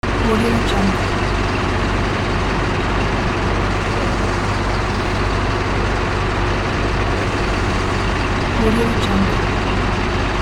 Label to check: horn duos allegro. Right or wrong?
Wrong